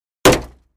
Plastic Car Door Hit

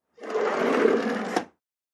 door slide close; sliding

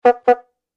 Bicycle Horn Classic

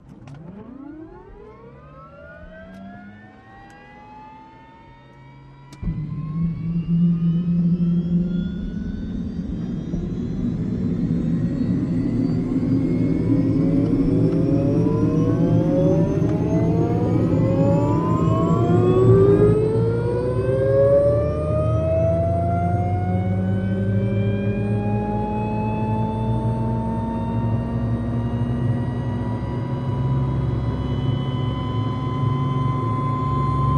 OH6 Loach Interior, little bird

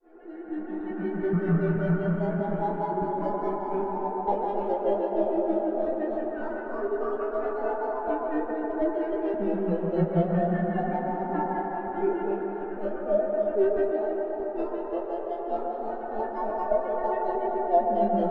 ALIEN SAUCER, spaceship; spaceship fx

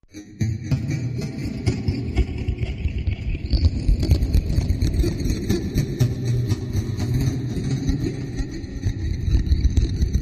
Scratch Grab Machine, sci fi ambience

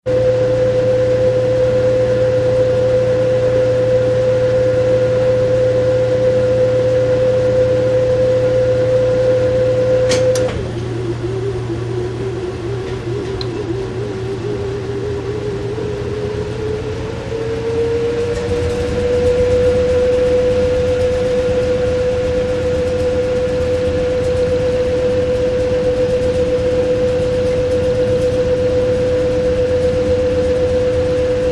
Wash Mach Drain Cycle, washing machine

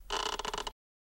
Chair Squeak Quiet; hinges & creaks

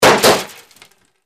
Bullet Impacts Metal

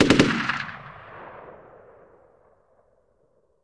Machine Gun Sngl Rap, single burst

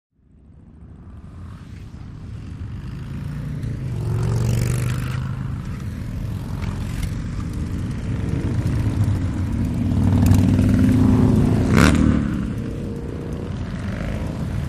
Multiple Motorcycle, harley davidson

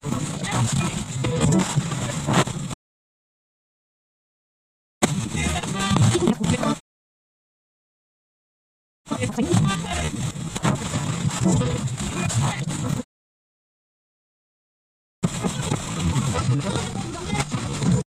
Radio Tuner Fm Fst Tuni, static

